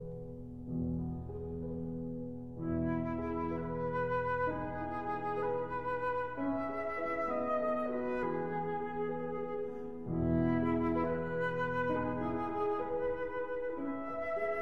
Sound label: Berceuse (Lullaby) for flute and piano